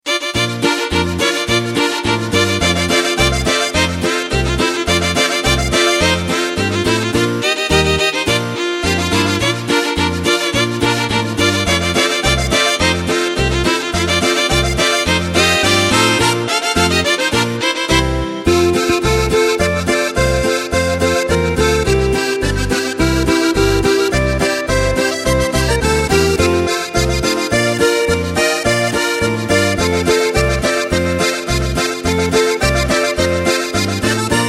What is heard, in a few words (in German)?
Boarisch